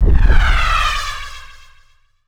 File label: sonic